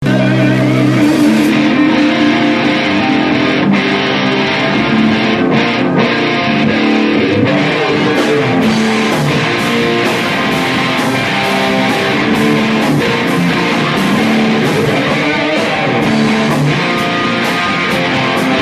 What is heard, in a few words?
jam room song